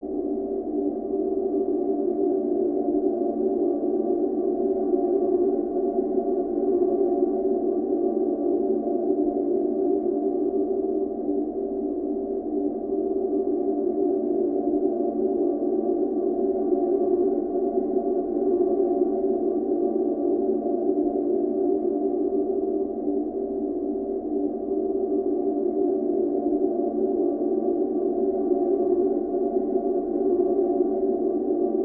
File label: Ambiance Tonal Hum; drones & tones